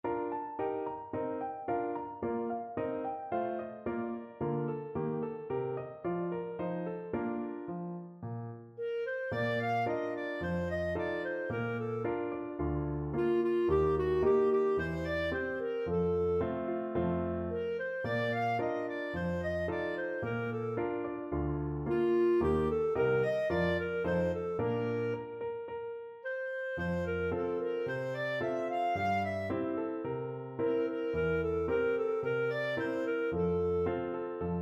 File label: a policemans lot, clarinet